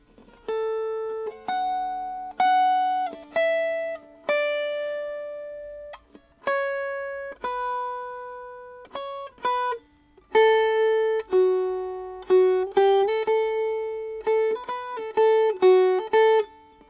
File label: Melody